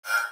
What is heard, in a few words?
hover; kaizoku